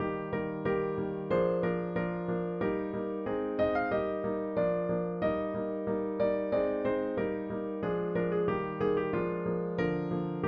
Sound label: Morning Music